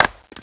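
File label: wood chop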